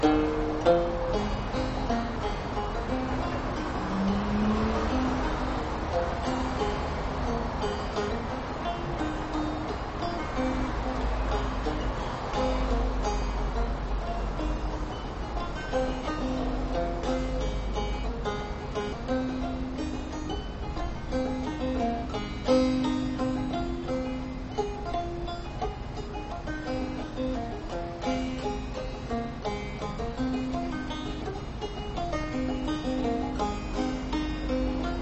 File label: banjo; alonzo